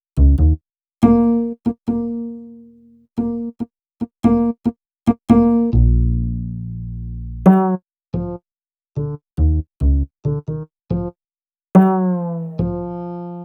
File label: Upright Goodness; Sonic Foundry; Cinematic Funk Urban Tone Poems; Master Zorn